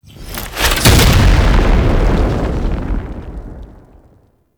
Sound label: Interior Orbital Station Console Deploy End; Warframe; Tau